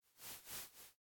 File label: fire; noteye; roguelike